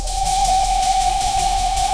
whoosh

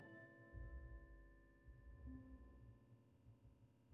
dramatic reveal, sound effects

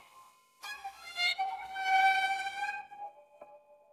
sad violin; sound effects

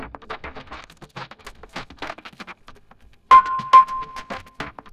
keyboard typing; sound effects

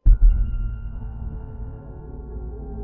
door creak; sound effects

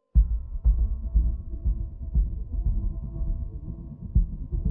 riser sweep; sound effects

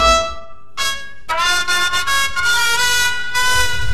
tadaa fanfare

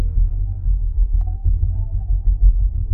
correct ding; sound effects

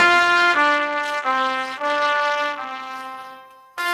victory fanfare; sound effects

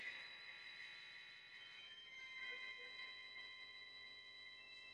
laugh track; sound effects

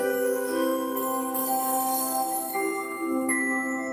magic sparkle; sound effects

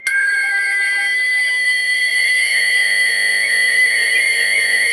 alarm bell; sound effects

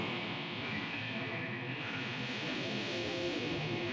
thunder

